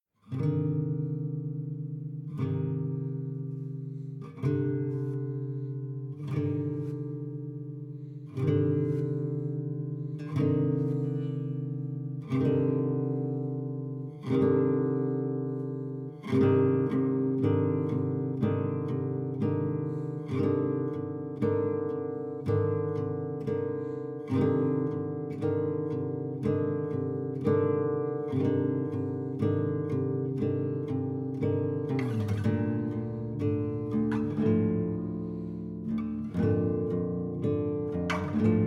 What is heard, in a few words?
Savannah Music